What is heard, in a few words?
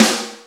SNR ROCKE, snares; Roland L-CD701; Sn Modules 7